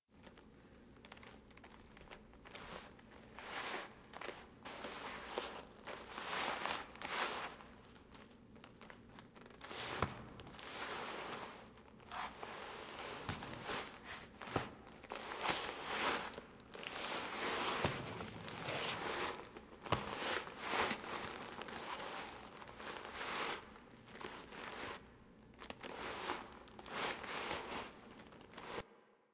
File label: Orbiter Wake Up Foley Operator; Warframe; The War Within